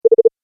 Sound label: Rapid Soft Bleeps, beep sound effects